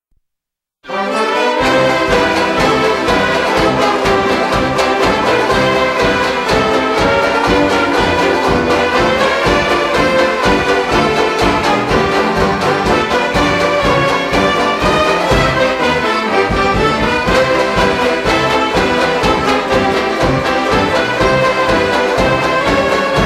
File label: Banjos